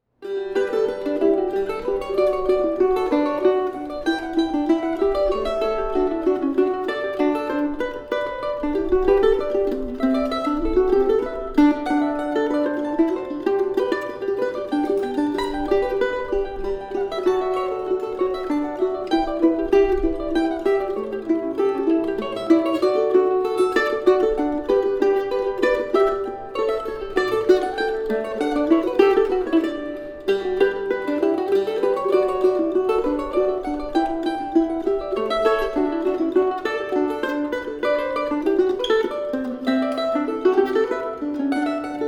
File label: mandolin duet